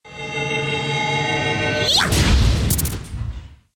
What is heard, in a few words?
Runecaster Attack; Puzzle Chronicles; PS3; PSP; X360